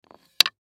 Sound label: Croquet Mallet Down, Croquet Mallet Set Down; SPORTS; Blastwave